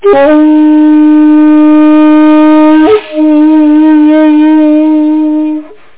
japanese flute

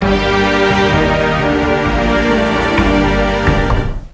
lose

